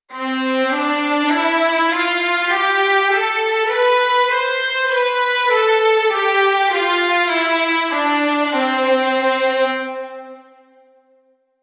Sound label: Strings Brass